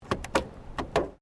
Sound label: Car Ford Focus Bonnet Key Cover Open; Ford Focus hire car; Cars; Vehicles